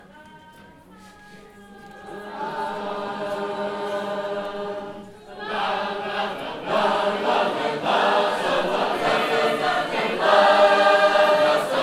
Sacred Harp; Calvary

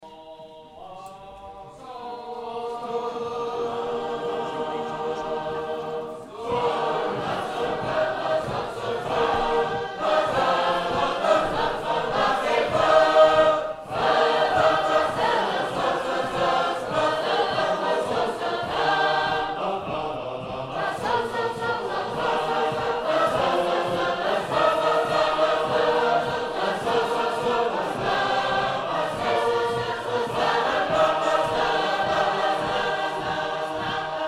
Schenectady, sacred harp

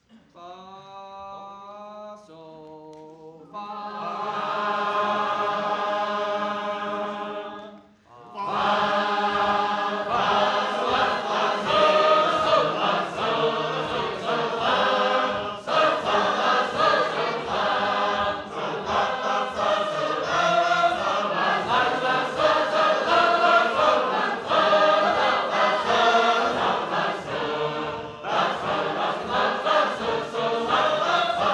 Providence, sacred harp

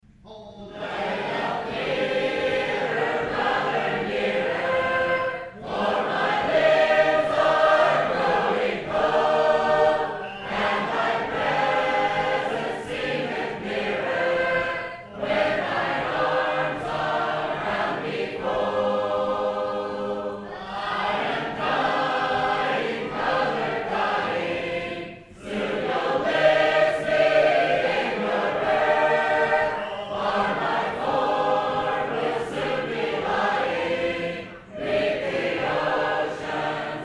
The Dying Californian, sacred harp

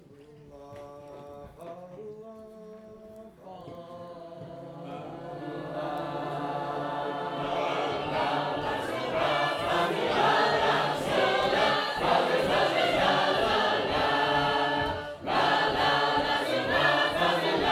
Sacred Harp; The Golden Harp

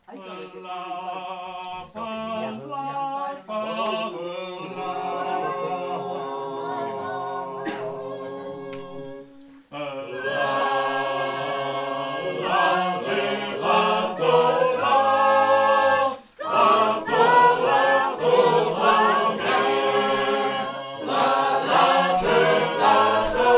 small group, sacred harp; Hickman

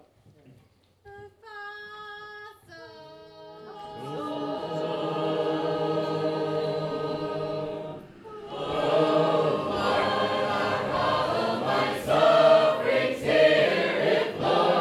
Hallelujah, sacred harp

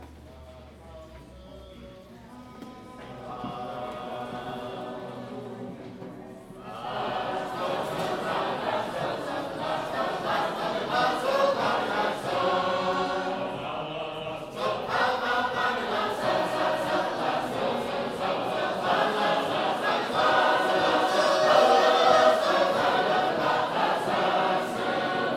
Sacred Harp; Swanton

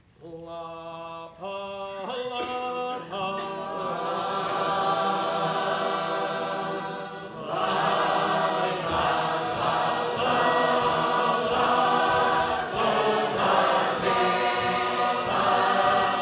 Kedron, sacred harp

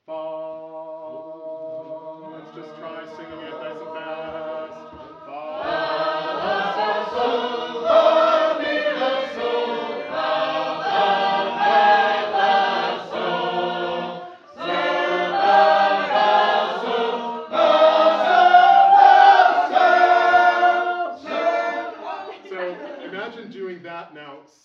Sacred Harp, keyed too high

